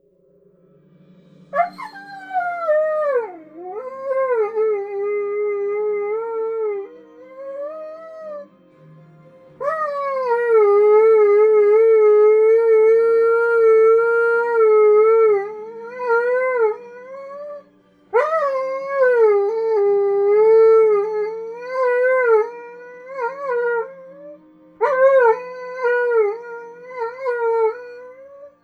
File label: howl; Emerdale